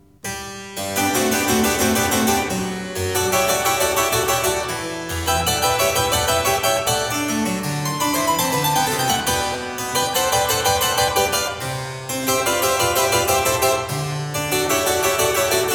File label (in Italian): Variatio 29 a 1 ovvero 2 Clav.; BACH; GOLDBERG VARIATIONS